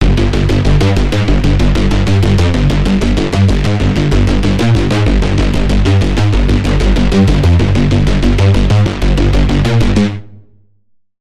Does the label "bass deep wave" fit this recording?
Yes